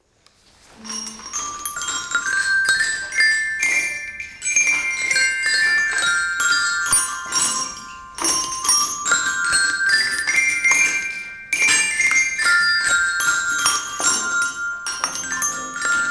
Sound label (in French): impro carillons; CM1-CM2